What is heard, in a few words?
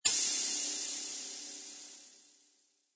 Downlifter; DESFX; Zenhiser